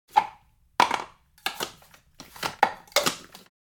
Kitchen blender mixer food processor place the ingredients inside